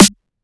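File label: drum and bass Snare; SR71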